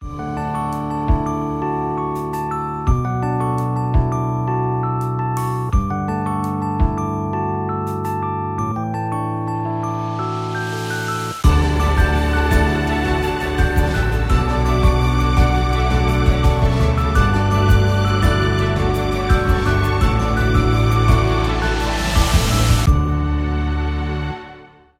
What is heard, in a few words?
Romantic Ringtone